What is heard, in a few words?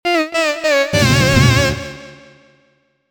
Roulette ~ Lose; Clockwork Knight 2; saturn; Soltian